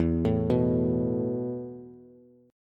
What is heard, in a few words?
E Suspended 4th E, down, Bass; Esus4 arpeggiated